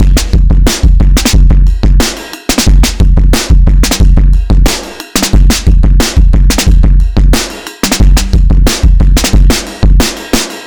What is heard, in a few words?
Ride